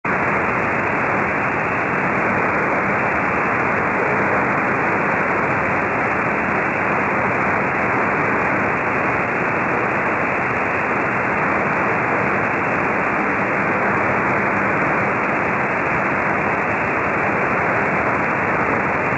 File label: 09760khz jamming; voa